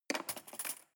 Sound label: cutlery cake fork drawer scrape